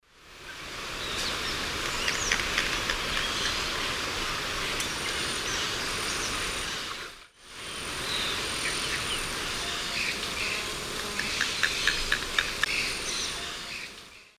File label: Anhinga anhinga, call; S. America; Eastern Lowlands